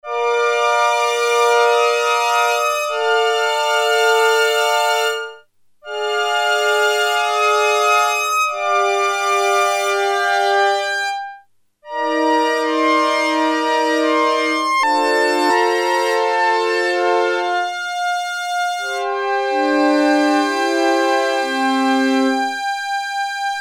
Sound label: High Strings; Sequential Circuits Prophet-5 (Ver.3); Factory Patch